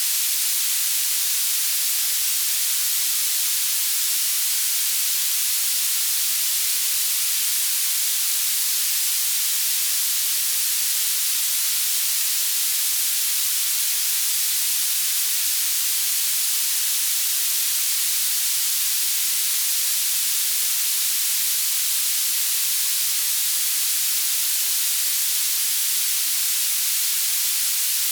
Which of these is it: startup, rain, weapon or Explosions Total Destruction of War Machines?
rain